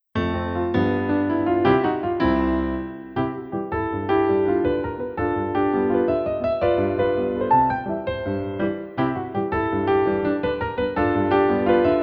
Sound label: Tendu & Glisse; Adv 2 Ballet